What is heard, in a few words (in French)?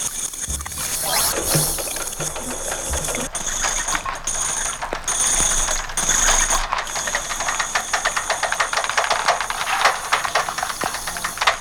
paysage personnage nuage